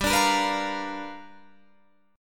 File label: G 7th Sharp 9th G chord, Guitar Open D